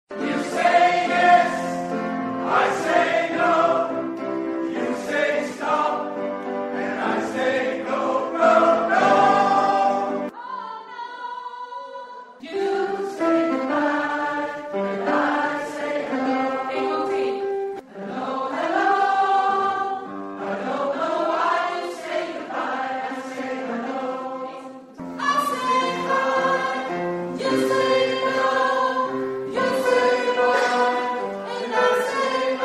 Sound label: tenor